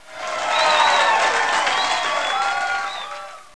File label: Cheer; Intel Createshare; PC Camera Games; Shoop